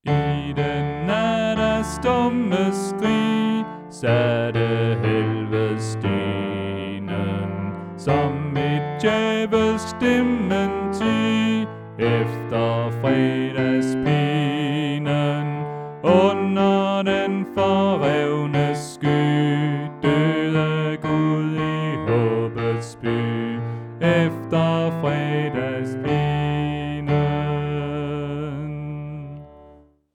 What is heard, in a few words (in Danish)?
Bas; Påske